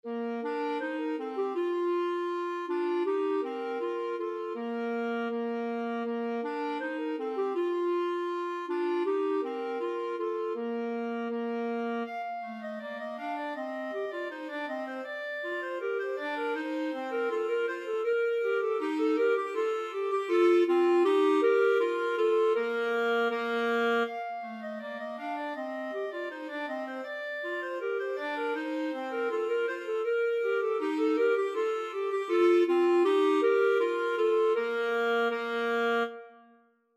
clarinet duet

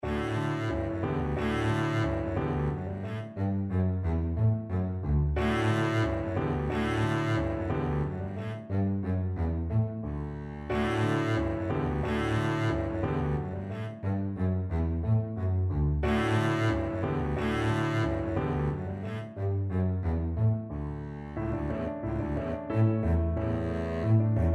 bach musette double bass

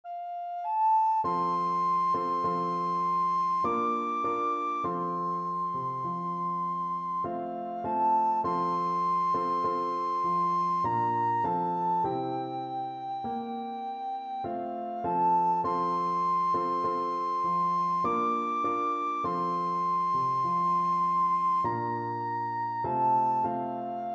kumbayah recorder

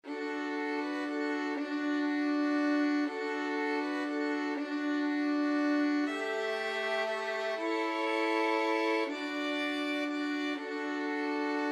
violin trio; silent night

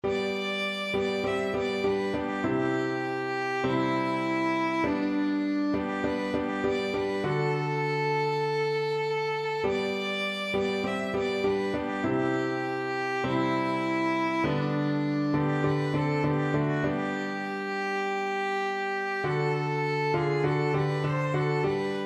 what a friend violin

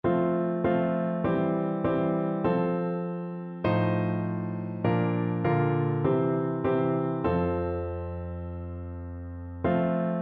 river glorious, piano

